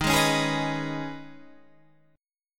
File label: Eb 7th Suspended 4th Sharp 5th, Guitar, Standard; Eb7sus4#5; strummed; 11,11,11,13,12,11; JGuitar